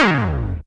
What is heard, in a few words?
BREAKDOWN, Techno Trance; E-MU Formula 4000 Series Vol. 2; Orbit Presets